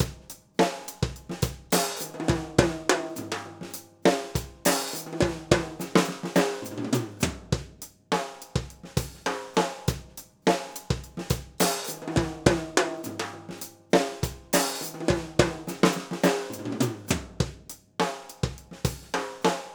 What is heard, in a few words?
overhead; micros overhead; audioctone km-8p